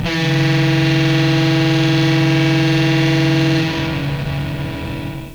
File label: ship siren; dm research vessel